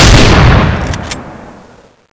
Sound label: rifle fire; XDM